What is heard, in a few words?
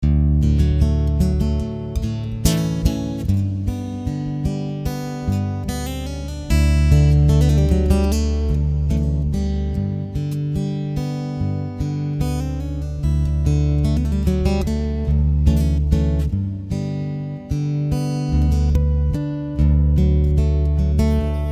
Solo Guitar; dance band; Rockaway Entertainment